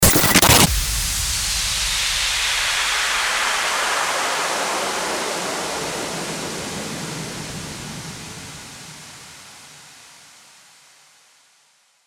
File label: STARTER WHOOSH